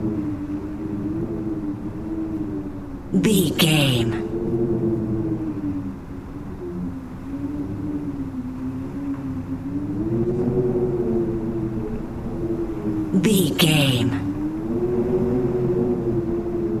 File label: wind cold howling haunted loop